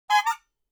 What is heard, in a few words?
cheerhorn